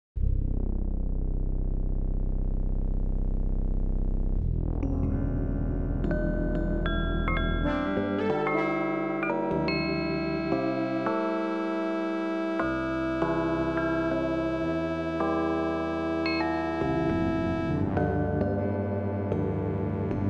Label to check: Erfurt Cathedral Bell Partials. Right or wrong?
Right